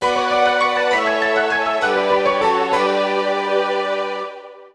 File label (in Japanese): ジングル：詩人